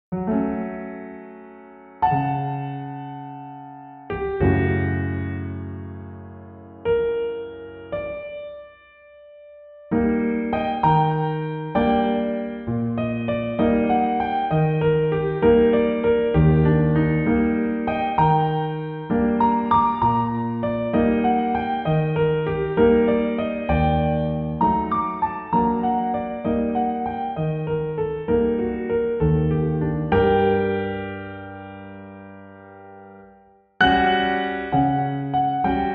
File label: solo piano